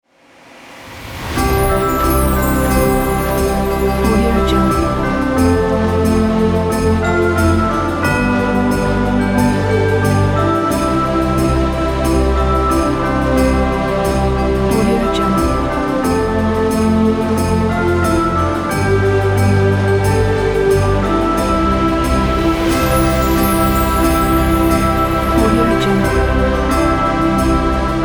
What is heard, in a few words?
jingle bells